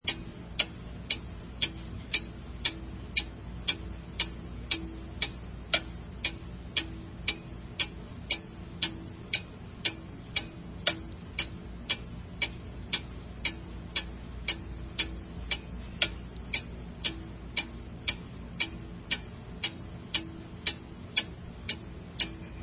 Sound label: clock ticking